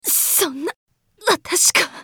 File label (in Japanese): 死亡; カリュケ